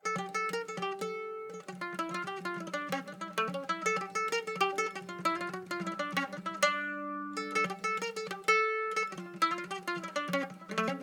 Tavern; assassin s creed unity gamerip 2014

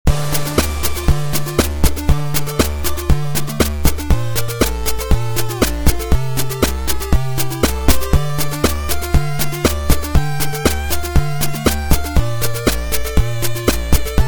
song; Robot Wants Fishy

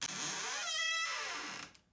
Doorcreak; five nights at freddys the first generation

